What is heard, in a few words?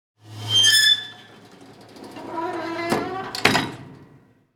Service elevator arriving door open